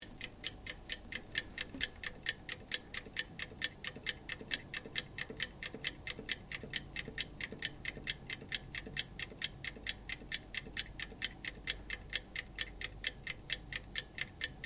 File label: OVEN TIMER OPERATING LOOP, Common oven sounds; Appliance Sound Effects; Appliances (Sound Effects:Household)